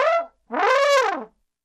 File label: Trombone Wolf Whistle